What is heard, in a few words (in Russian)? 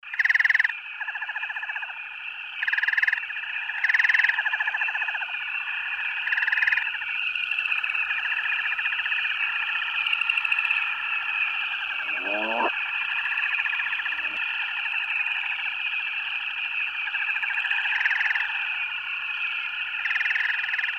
zvuki na bolote